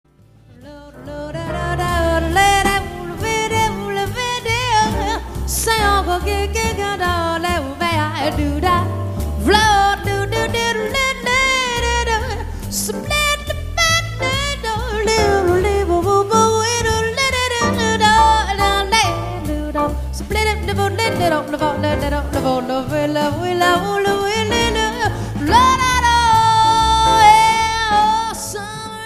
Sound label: Jazz Duo, sax guitar